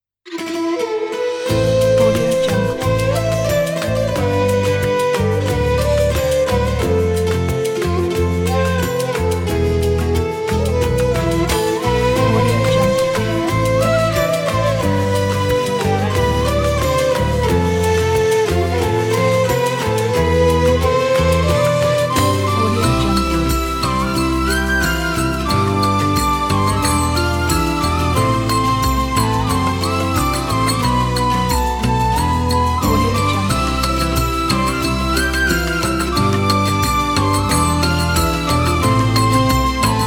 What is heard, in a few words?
ramadan